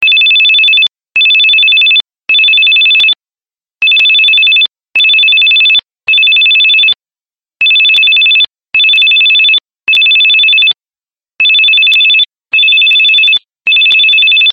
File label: Telephone Ring